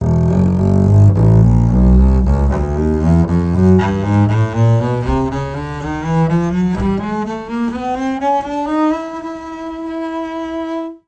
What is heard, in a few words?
double bass range